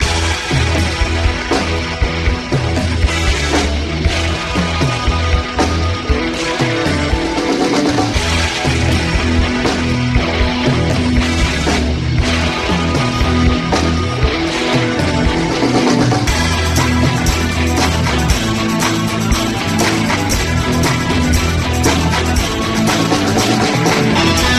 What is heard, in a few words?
Game bgm